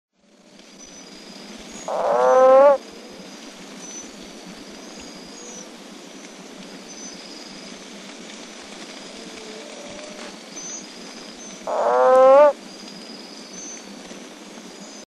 Rhombophryne testudo

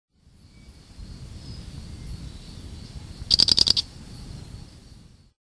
Blommersia grandisonae

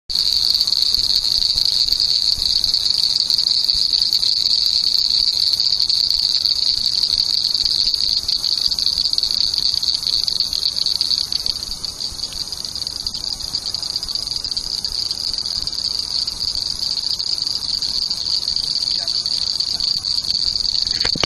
Acris blanchardi

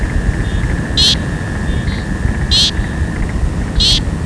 Crinia parinsignifera

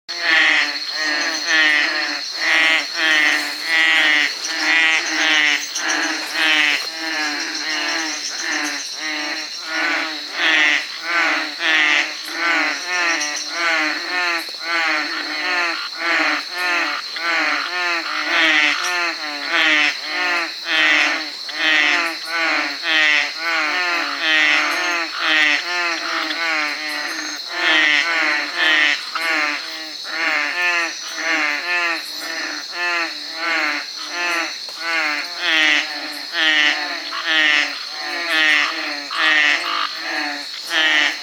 Trachycephalus typhonius

